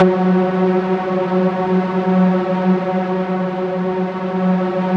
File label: F#3, Yamaha VP-1; Music Store; 90's Sample CDs